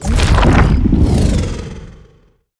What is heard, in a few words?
Monster; Zenless Zone Zero